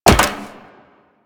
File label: Wall Jump Metal Perforated; Footsteps; Warframe; Tenno; Wall Run